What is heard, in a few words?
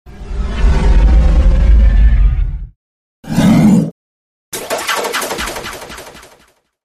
Sonic Scrape Mini Scrape Taser